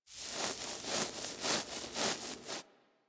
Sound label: Foley Interact Sit Scratch Side; Enemies; Kubrow; Warframe